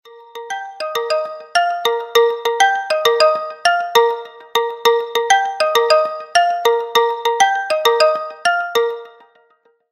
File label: lenovo default ringtone